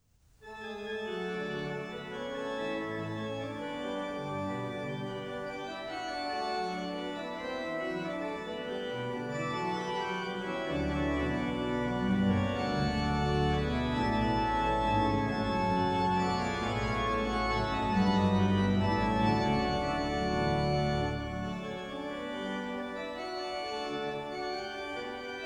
Chorale Preludes for organ; Op. 122 No. 4; Herzlich tut mich erfreuen

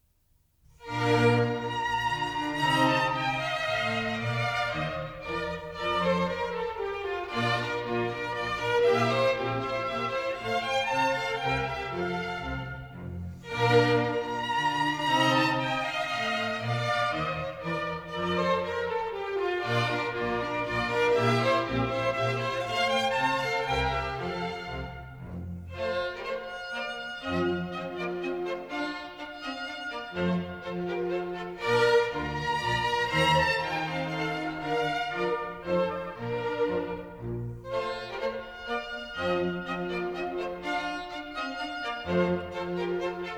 Minuets No.4 in B flat

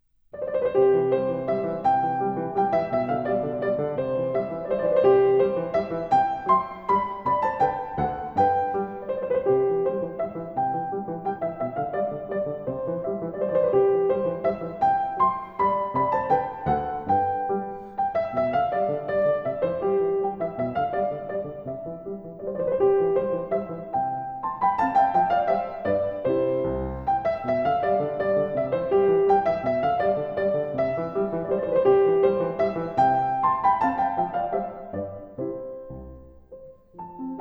Menuetto in C; 8 Menuette KV.315a

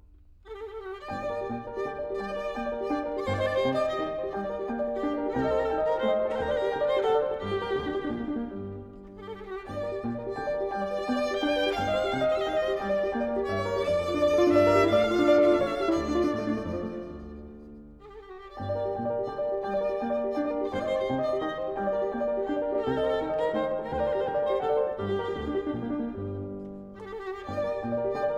Piano and Violin in G minor; 6 Variations; KV.360; on Helas j'ai perdu mon amant

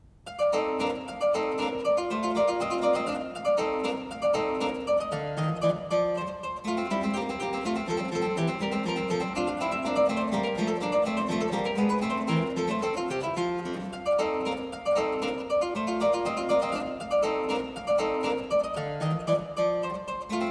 Presto in B flat Major; The London Sketchbook; KV.15ll

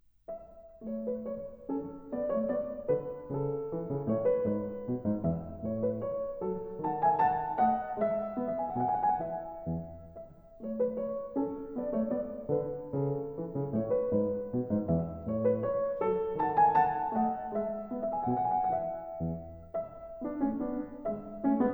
Menuetto in A; 8 Menuette KV.315a; Menuetto 7

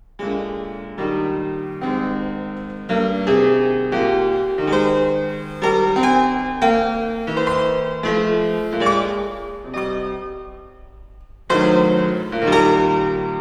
Modulating Prelude

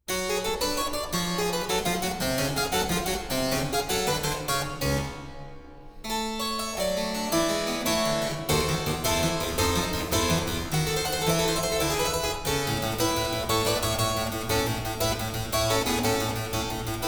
Andante in G Minor; The London Sketchbook; KV.15r

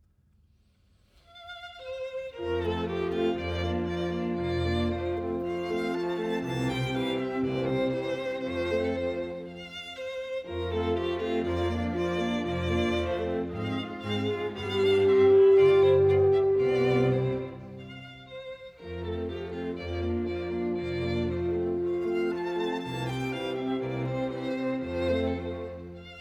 Divertimento in B Flat Major Andante grazioso; Divertimento No.15; KV.287; Tema con variazioni

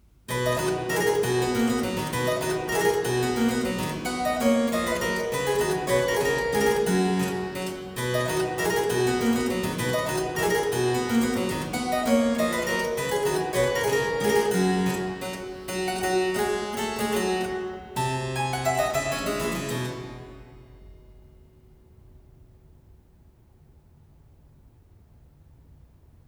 Minuet in C Major Fragment; The London Sketchbook; KV.15rr

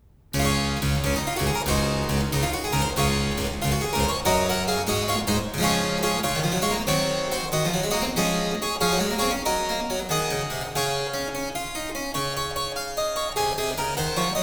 Piano Sonata in D Major; The London Sketchbook; KV.15bb

